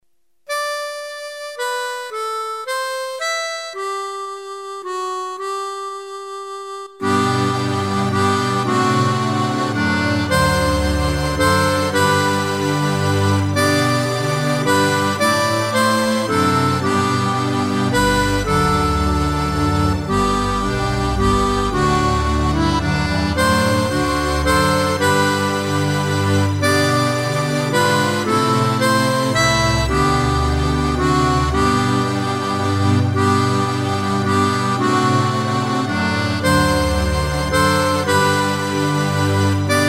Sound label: instrumental; glória damos a jesus